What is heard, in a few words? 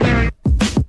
dirt style with stab, Kick Snare; Drum loops